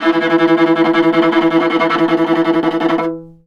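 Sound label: VIOLA tremolo E2; Orchestral; DirectWave; Varazdin Orchestral; Viola II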